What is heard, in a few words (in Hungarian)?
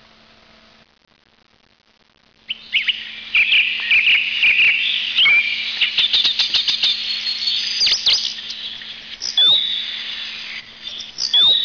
enekes rig